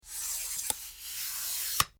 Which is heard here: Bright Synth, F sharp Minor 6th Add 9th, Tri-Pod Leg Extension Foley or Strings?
Tri-Pod Leg Extension Foley